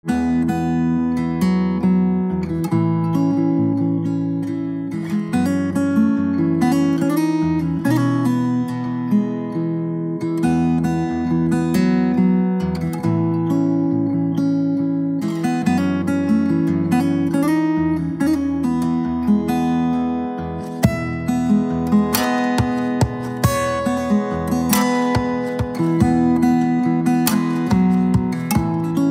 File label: Guitar